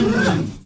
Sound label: errryah, Voice; single hits